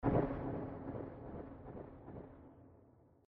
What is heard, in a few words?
step; csgo; scp 106